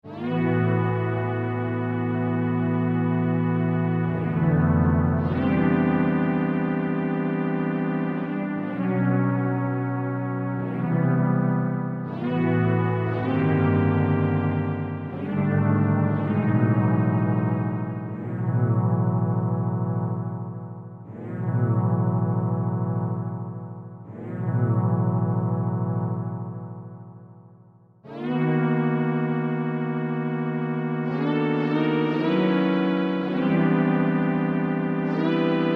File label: midi sketch; weeklybeats 2024